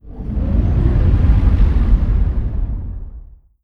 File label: moving out colossus; apocalypse